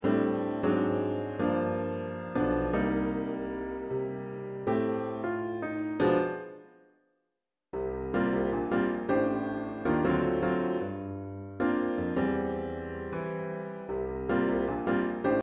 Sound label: Piano